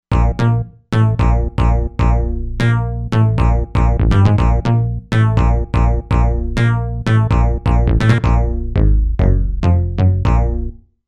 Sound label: Bass Talkin Bass